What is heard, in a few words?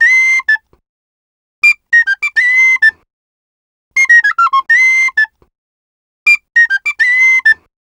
Penny 103bpm F, Penny Whistle; African; Quazibeat; African Secret